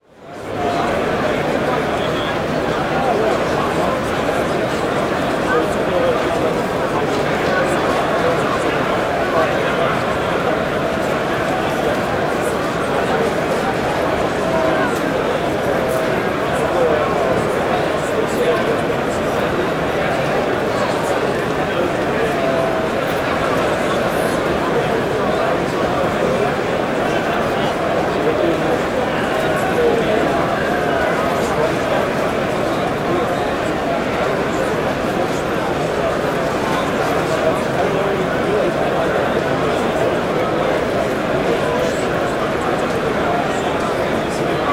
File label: Convention Active Exhibit Hall, Walla; Sound Ideas; Walla Series